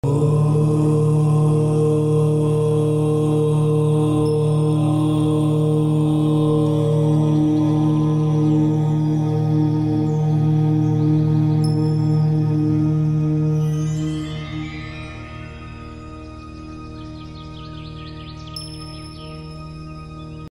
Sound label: Cosmic music